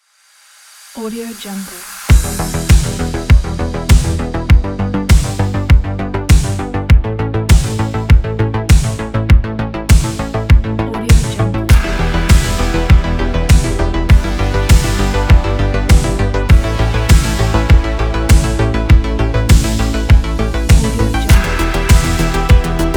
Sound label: neon retro waves